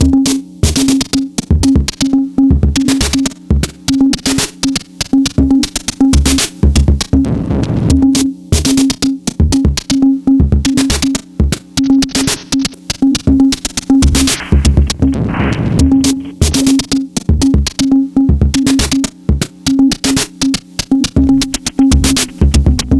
tricky clicky; Polyend

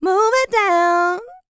LICKS, Vocal R&B Dance; LT DANCE

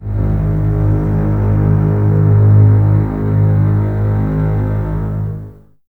Basses G#1, String Ensembles; Miroslav Vitous; 90 s Sample CDs

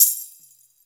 TAMB, TAMBOURINE

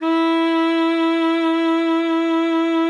E4, SAXOPHONE; Session Instruments; Best Service ProSamples vol.42

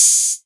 808 Hat O

